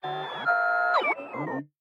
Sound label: flying robot; factorio; Factorio 0.14.21